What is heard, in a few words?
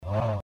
uauh